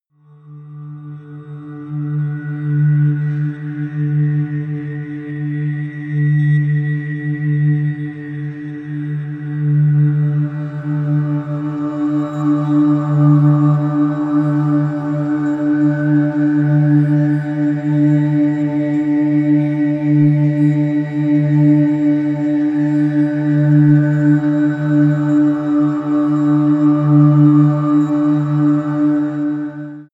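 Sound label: chrystal air, pads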